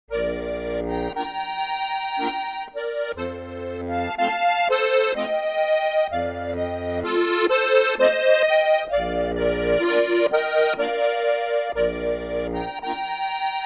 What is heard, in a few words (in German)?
bauernmenuett